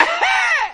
ah haa, laugh; ooh ahh

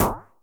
plerts, Ticks; Misc Percussion

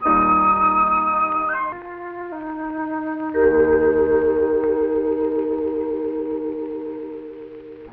flute, Jazzmatazz loops

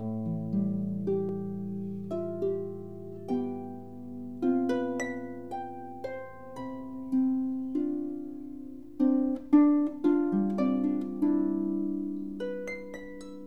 guitar, Sad

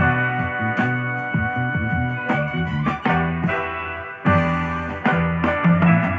horns, loops

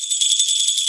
bell end, shaker

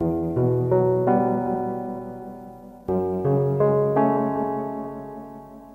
keys, Loops, Sad

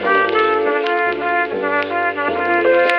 horns, Loops

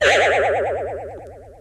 boing

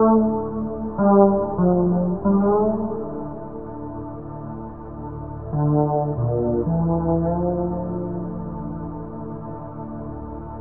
keys, Sad

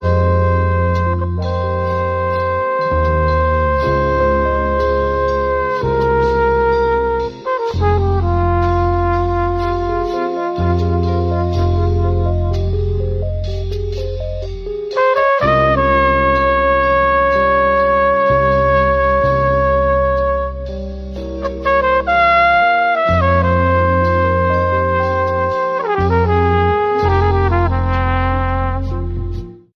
horns, jazz loops

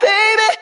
"baby" male SUNG; ooh ahh

